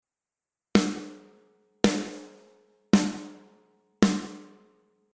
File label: kick